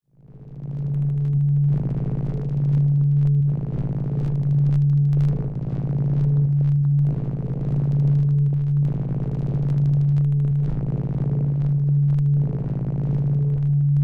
Erica Synths Liquid Sky Dada Noise System 2 Electric Cat Drone